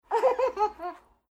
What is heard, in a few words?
baby laughing